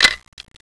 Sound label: Nikon F2A regular